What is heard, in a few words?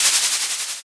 eraser